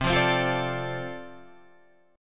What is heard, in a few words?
D-Minor 7th C, chord